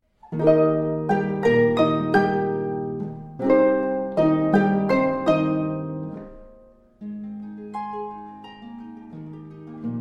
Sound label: Sonata Op.34 No.1 E Flat Major Allegro Brillante; JL Dussek